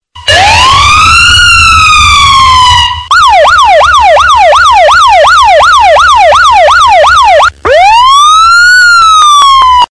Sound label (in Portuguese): alarme policial extremo